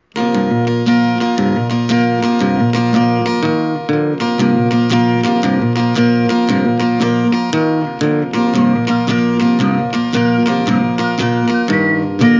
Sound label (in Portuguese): trilha instrumental; ajude o hu